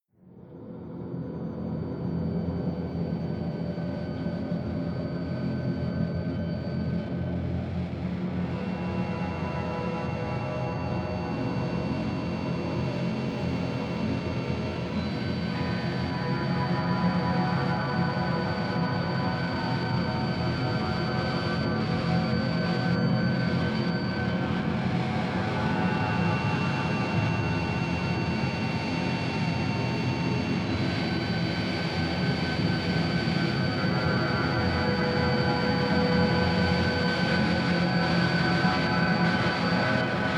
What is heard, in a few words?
intro instrumental